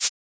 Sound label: Shaker; FLPRO